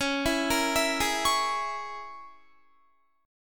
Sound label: C sharp Minor 6th C sharp, Guitar; C#m6 arpeggiated